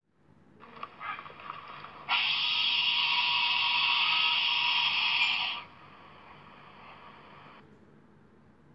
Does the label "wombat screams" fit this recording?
Yes